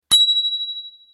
Fahrradklingel Golf

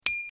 Fahrradklingel Genau

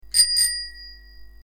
Fahradklingel; rockerbraut pink

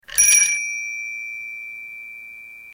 Fahrradklingel Disco

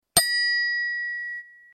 Fahrradklingel Classic Vollmetall

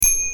Fahrradklingel Classic mini

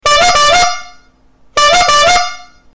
Fahrradklingel Hupe

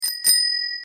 Fahrradklingel Freibad

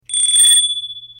Fahrradklingel Karussell

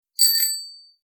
Fahrradklingel; happy cow

